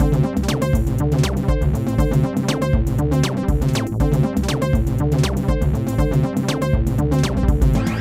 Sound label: Electro